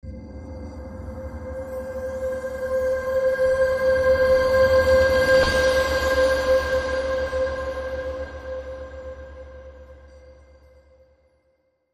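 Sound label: Fairy Bells Crescendo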